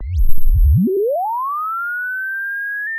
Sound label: Sound of a bird tweeting nicely